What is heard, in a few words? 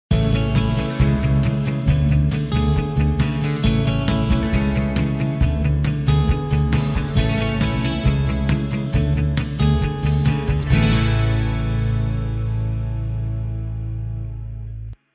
AMBIANCE POP COOL